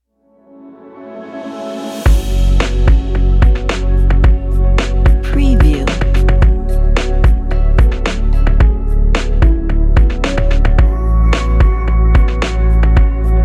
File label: logo tech real